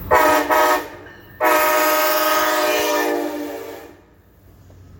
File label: Siemens SC-44 Horn